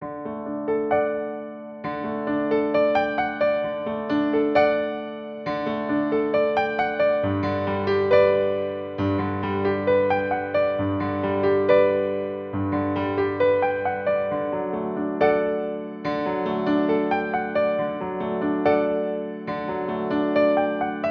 Simple Beauty Piano

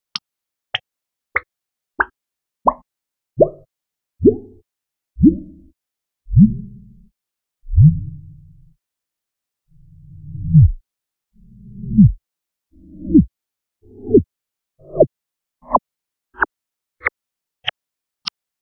Processed Bit Of Wobbly Plastic Disk; Qubodup

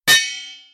Light ringing clang

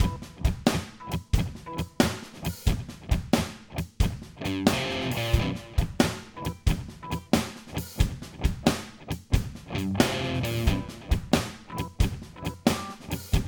Rock Blues Loop

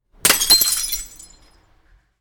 Breaking A Bottle

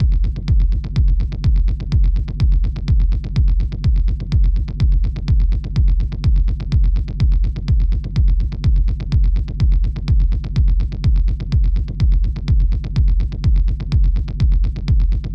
Dark Techno Kick Drum Loop

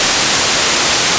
Minimoog White Noise

